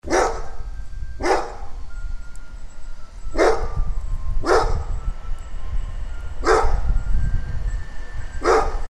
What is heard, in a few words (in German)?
Hundskerl, Scrap Collection; Watchdog; Reisholz/Düsseldorf; Christoph Collenberg